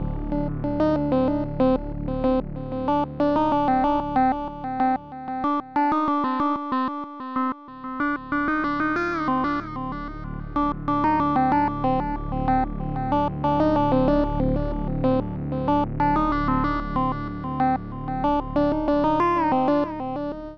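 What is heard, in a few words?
keygen song.mod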